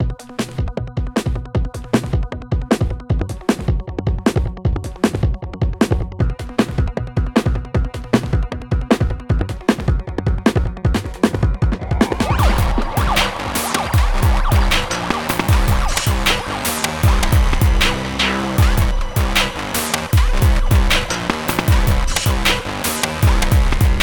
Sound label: electronic loop; Avoid Sensory Overload